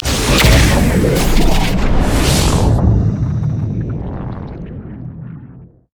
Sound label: respawn; LoL; velkoz